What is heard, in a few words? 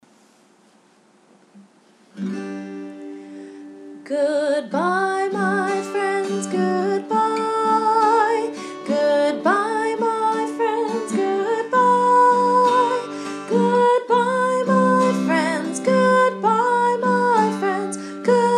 goodbye song